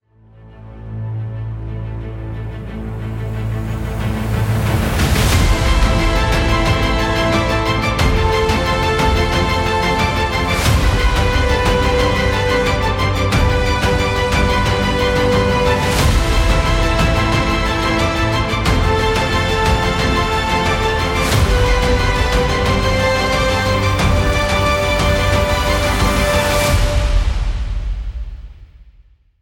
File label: rising empire